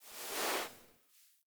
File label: Foley Idle Sit; Enemies; Kubrow; Warframe